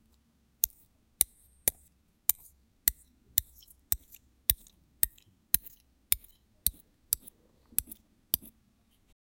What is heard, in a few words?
i cut something hard and, cutting